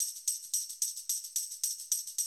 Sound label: 110, Classic Drum Loops